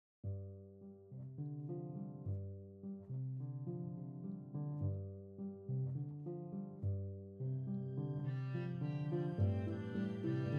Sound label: Quartet, Tension 공포